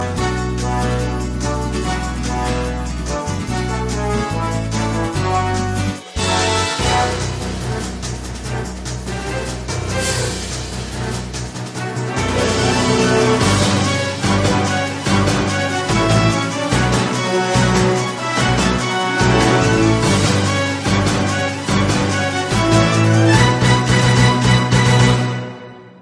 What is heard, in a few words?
Ocarina Medley; Ssbb